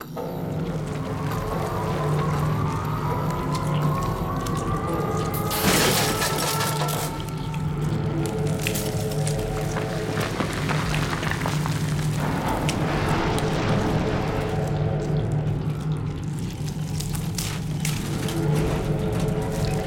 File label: Bathroom Shift; silent hill homecoming 2008 gamerip pc ps3 x360